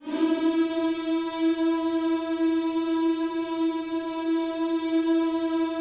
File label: HMMS E, Gospel; Spectrasonics; Vocal Planet Vol.1 Gospel; akai